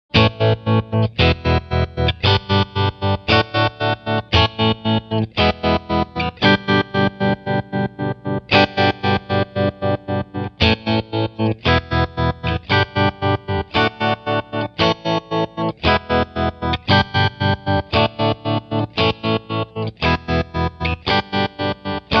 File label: stutter